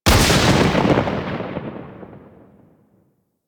Lightning Impact; Warframe; Teralyst; Rainalyst Abilities

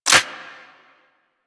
Rifle Charged Mech; Warframe; Infested; Quanta

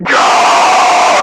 Screamer Death; Warframe; Lasrian

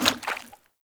Heavy Grunt Boot Walk Water, Footsteps; Characters; Enemy; Sinecure

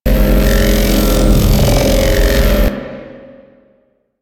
Rail Jack Weapon Four Loop; Modular Weapons

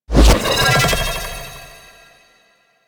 Aura Ability End; Warframe; Animus; Anima Animus

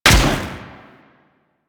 Vomit Gun Shot, Weapons, Infested; Warframe

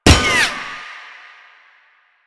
Tank Shield Impact; Enemies; Warframe; Lasrian

